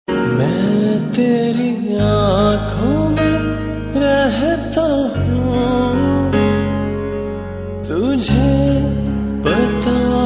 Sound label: slow ringtone